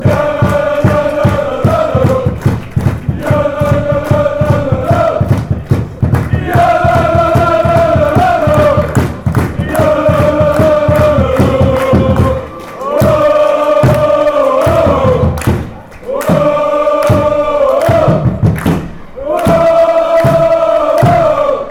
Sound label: jololo woah